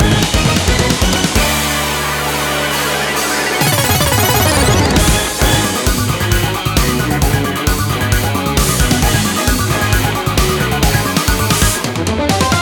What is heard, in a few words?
BOSS Main Intro, Music; TMNT